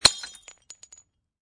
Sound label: Glass Bottle Drop Empty Break, Impact, Glass Beer Bottle, Empty, Break On Cement; Glass Sound Effects